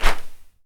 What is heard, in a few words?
SWISH, FIGHT; 1000fx